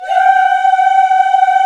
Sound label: VOX African Yas; Roland LCDP11 Africa VOL-1